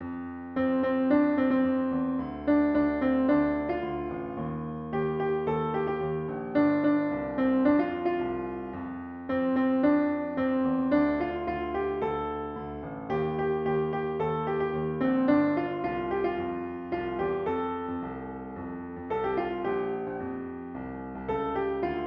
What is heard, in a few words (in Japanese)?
ピアノ